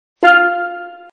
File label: F Drum, Casio